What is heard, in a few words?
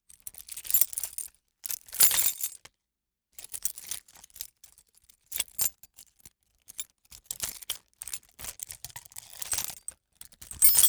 house keys